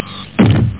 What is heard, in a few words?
stomp